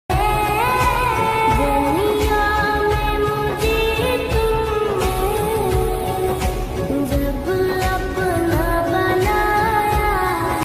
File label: islamic naat ringtone